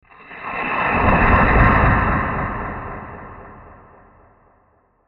Fast Science Fiction UFO Flyby Transition With Delays; Crafted Signals